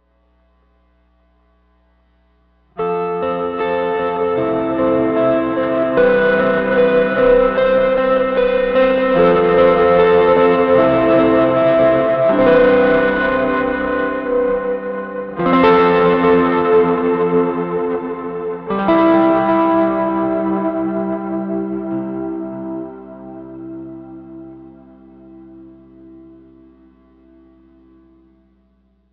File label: Panther SM57 Ambient